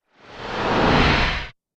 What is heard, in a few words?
Laser Whoosh; Whoosh Sound Effects